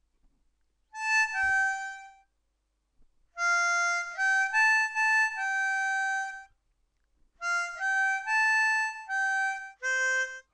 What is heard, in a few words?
Chorus Lead